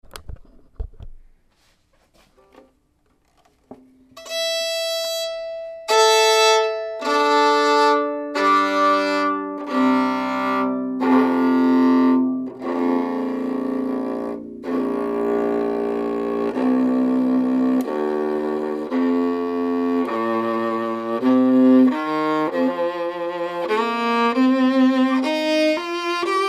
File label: 7 string acoustic